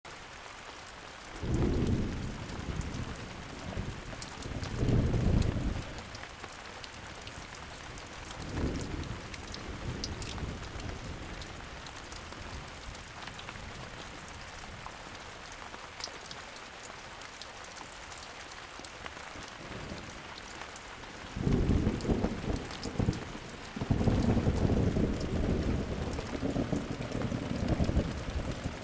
thunderstorm

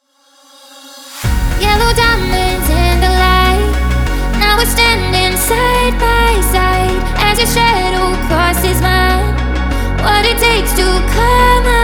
hypertechno

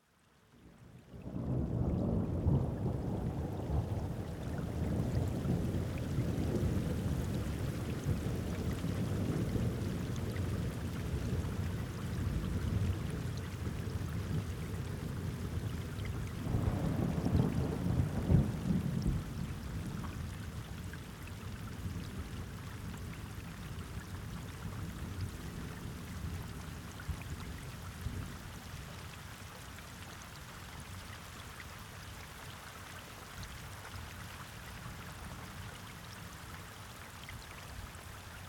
thunderstorm

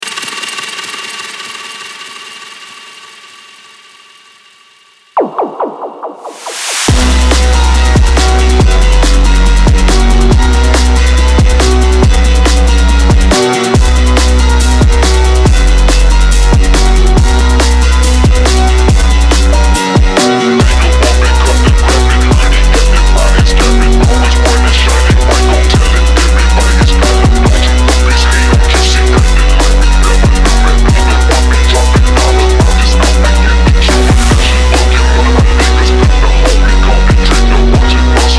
Phonk

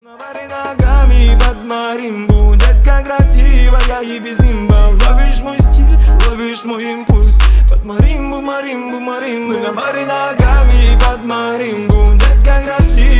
marimba rington

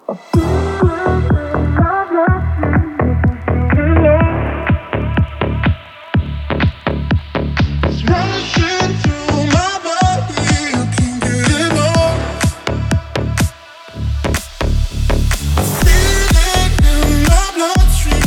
slap house mix

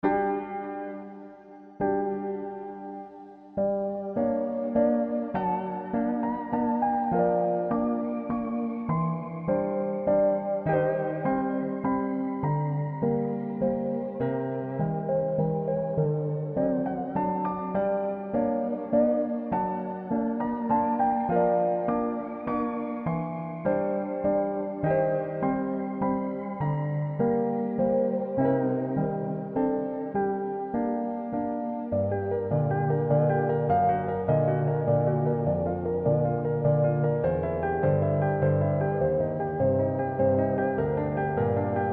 ambient slowed

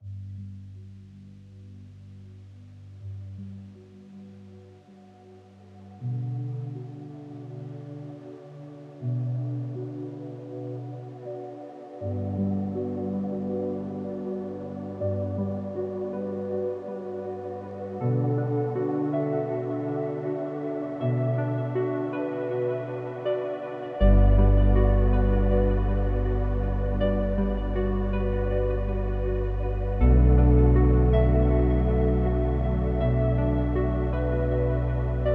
slowed

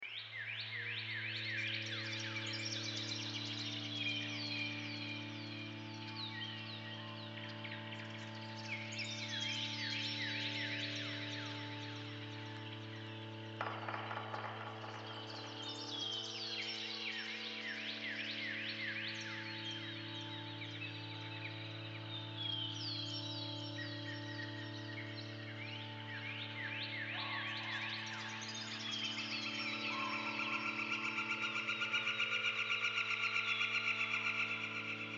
deciduous forest morning

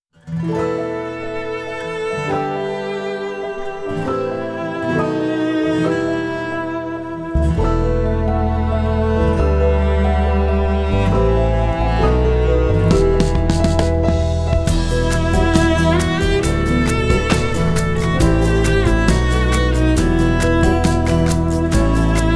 cello versiyon

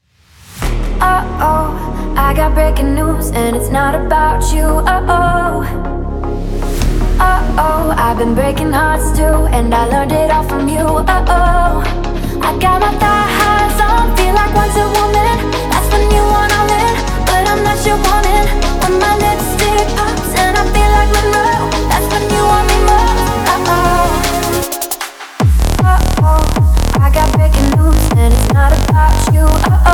hypertechno

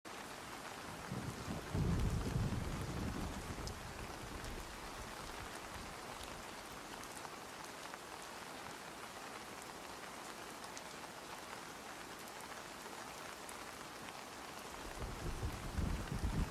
thunderstorm

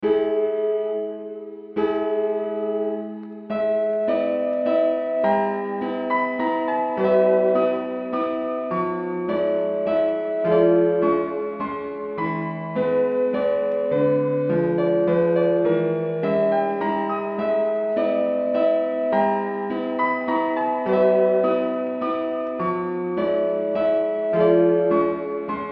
old doll out of tune slowed

